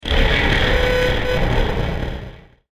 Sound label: Radioactive Explosion; Bomb Sound Effects